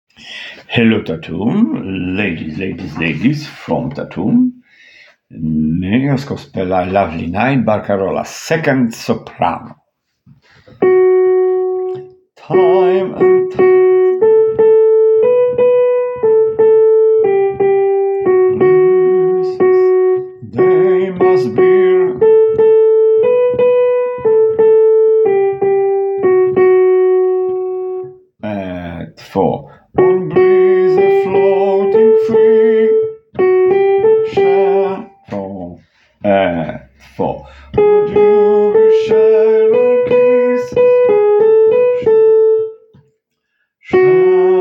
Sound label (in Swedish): Barcarole sopran; Barcarolle sopran 2